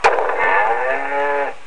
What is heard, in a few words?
thunk moo